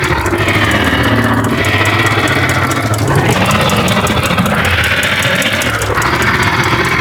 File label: Rush Loop; Ambience; Golden Maw; Warframe; The War Within